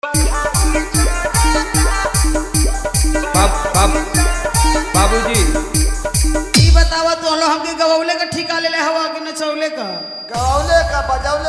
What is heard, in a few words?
New Bhojpuri Dj Remix Songs Full Bass; JBL Mix Song